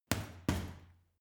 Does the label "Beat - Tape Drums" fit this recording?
No